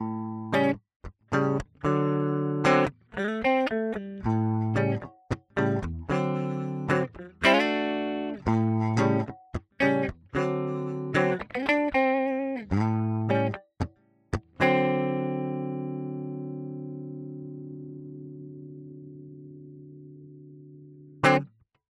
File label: clean pre; two notes torpedo pi-101 testbericht